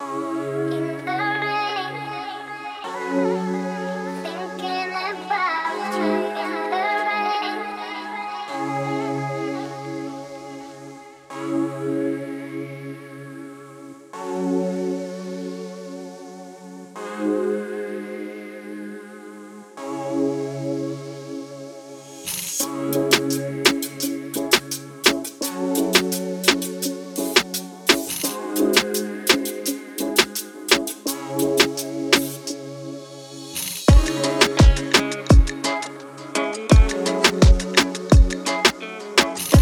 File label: The Rain 85 BPM